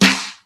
old school prominent snare drum g key

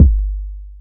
subtle reverb kick drum G key

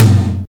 high floor tome drum sample f sharp key

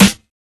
2000s verby acoustic snare sound g key; snare drum samples

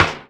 strong bottom end acoustic snare sample b key; Duration 0:01; Samplerate 44100 Hz; Bitdepth 24

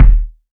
bass drum sample d sharp key; kick drum samples

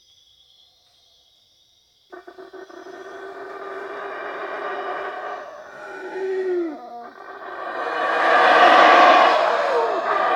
black howler monkey

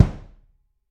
ambient kick drum sound c sharp key

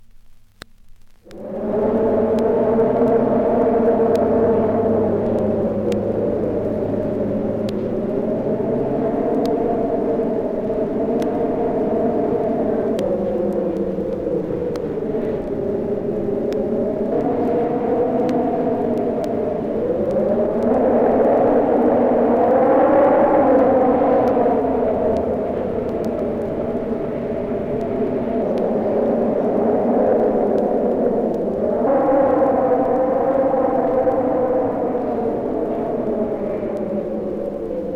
weird wind - horror effect

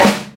studio snare g key

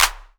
fresh hand clap c sharp key; single hits one shots

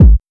smooth bottom end steel kick drum sound a key

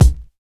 good kick f key, Loudest frequency: 512Hz; kick drum samples; single hits one shots

